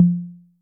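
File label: Analog Tom, Yamaha DTXpress; Electric Tom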